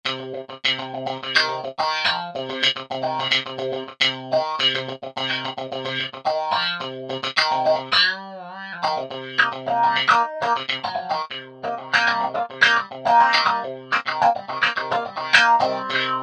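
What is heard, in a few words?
wah rhythm, guitar; Factory Patches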